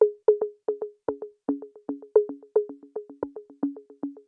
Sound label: echo bops